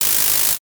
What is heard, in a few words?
shuffle